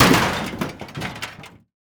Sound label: metal break; teardown; ps5 windows xbox series xs